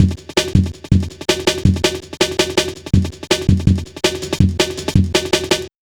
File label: Funki, Jungle, Drum Loops 163.5; Zero-G Jungle Warfare 1, 2 & 3